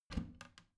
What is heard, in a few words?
bathroom cabinet both open soft